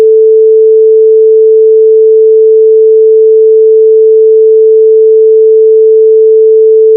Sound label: gapless sinus